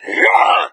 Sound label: attack, hazmat zombie; doom3